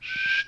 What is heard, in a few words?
clicked